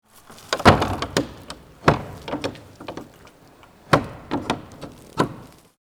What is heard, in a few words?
Earthquake in Kythera; Σεισμός στα Κύθηρα